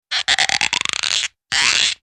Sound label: cartoon squeek